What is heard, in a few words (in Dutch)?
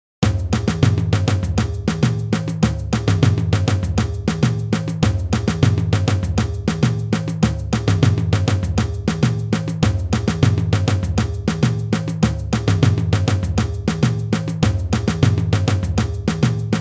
basispatroon timbala samba reggae